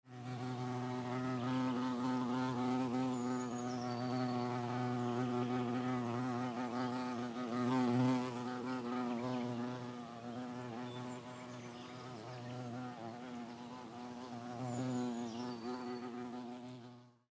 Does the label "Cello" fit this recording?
No